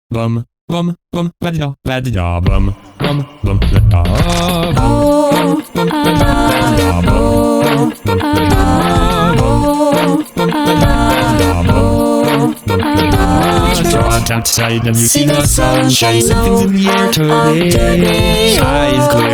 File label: Vocaloid Cover; Pony Music Archive